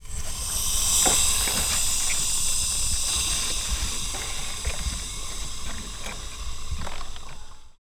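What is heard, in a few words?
Car Destruction Damaged Engine Exterior Heavy Damage No Oil Water Hiss; Pole Position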